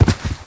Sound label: Skateboardkid Hit Ground; Playable Tutorial Level; TR1